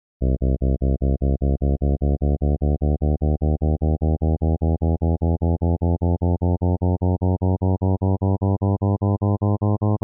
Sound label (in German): physiologische tonreihe